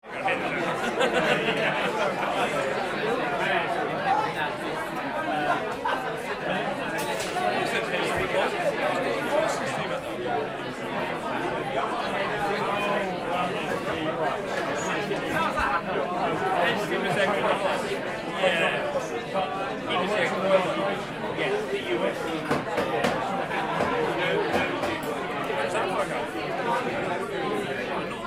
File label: Pub ambience people chatting exterior perspective